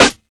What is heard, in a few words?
wet snare one shot f sharp key